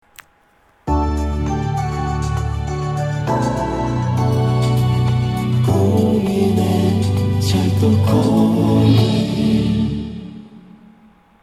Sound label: KORAIL LOGO song